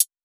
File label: closed hihat, drum one shots